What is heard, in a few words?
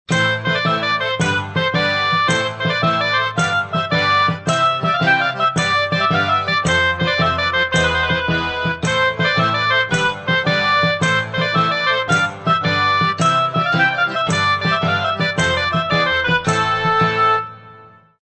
Tavern; uo